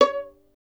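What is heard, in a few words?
STR violin; Miroslav Vitous; Solo Instruments VOL-2; Solo lnst. II; Vln Misc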